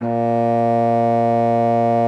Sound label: A#2, bassoon; Xsample VOL-6